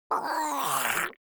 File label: creature monster gurgling high